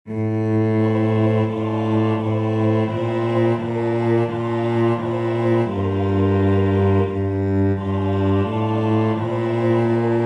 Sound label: O Sacrum Convivium Bass; bass part